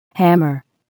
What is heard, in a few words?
hammer